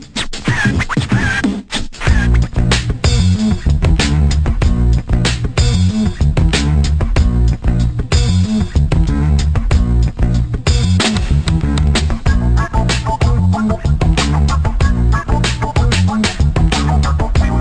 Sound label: Menu Music; THPS1